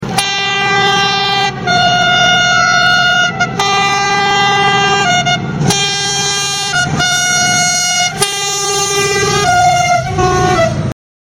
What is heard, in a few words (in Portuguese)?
Buzina; Comboios